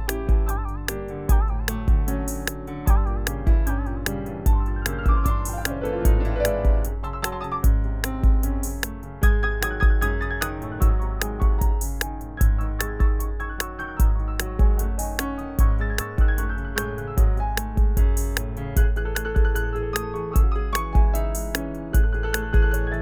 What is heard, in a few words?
piano